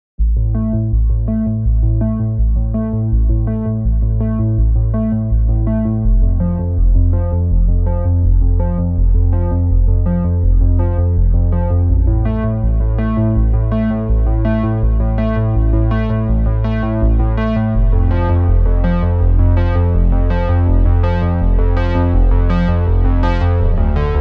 Bass ARP wet; Juno106

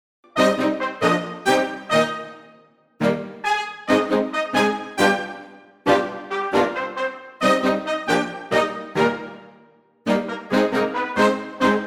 Swing More Section

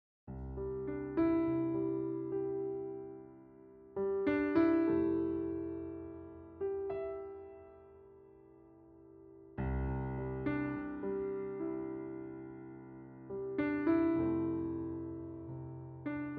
American Concert D; Amazona Demo

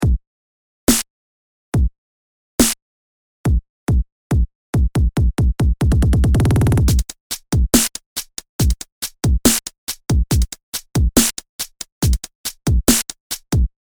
Phase Distortion

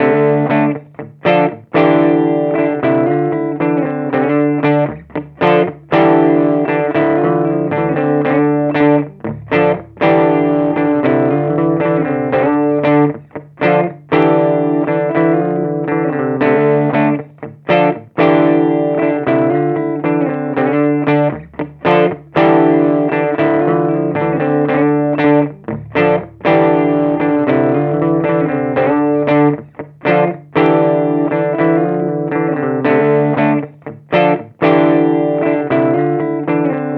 Torpedo CAB M 1 Rhythm Preamp

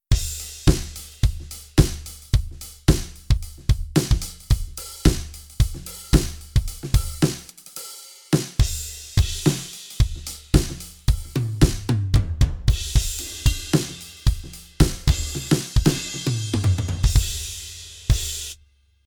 G9 Basic Rock

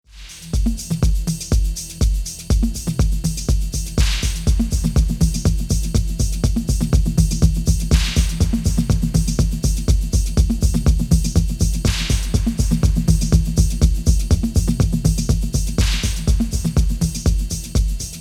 Tap Analog

